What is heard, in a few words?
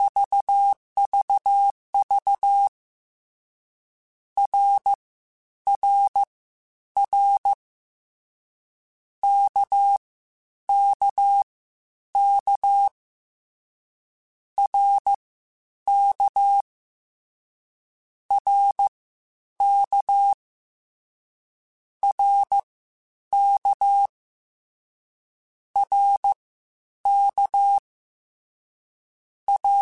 CW Code Groups Mixed Short Runs Balanced; HamRadio